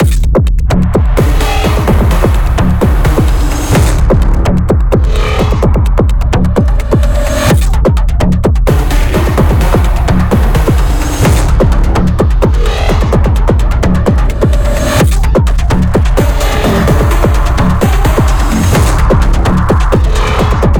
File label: Combat loop + Melody; Voidbreaker; ps5 windows xbox series xs; Biome 01 Teir2 Combatloop 02 + Melody